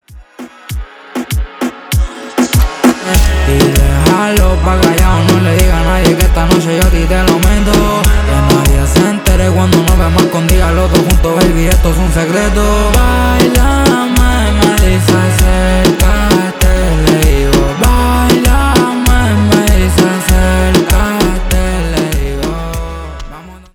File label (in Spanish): Intro Verso 98 Bpm; Essntial Tools